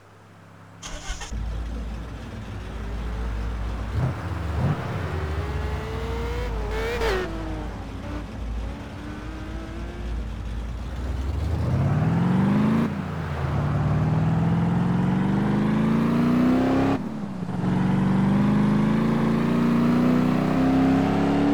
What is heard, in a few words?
mercedes-benz g 500